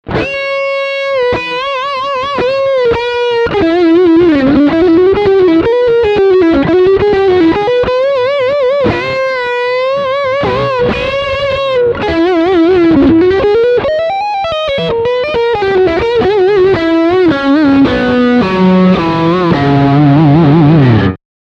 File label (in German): Beide PUs Distortion